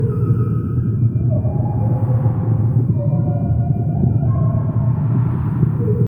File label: SFX loop; Disney's Dinosaur